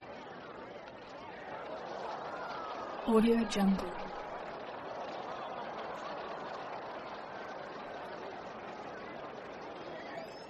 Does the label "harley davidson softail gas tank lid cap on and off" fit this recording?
No